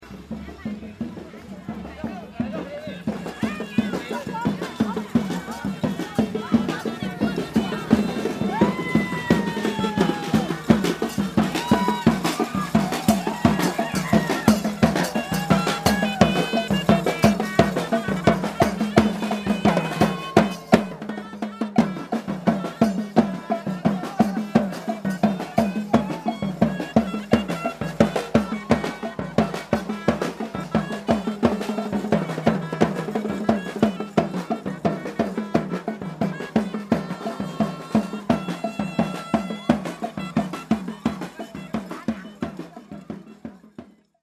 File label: procession